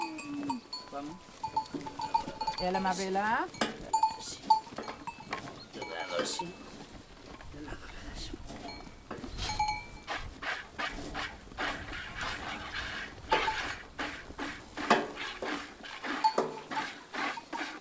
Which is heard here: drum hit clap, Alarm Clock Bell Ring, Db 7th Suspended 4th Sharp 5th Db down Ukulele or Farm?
Farm